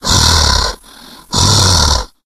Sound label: hulk breathe; Left 4 Dead; Source Engine; tank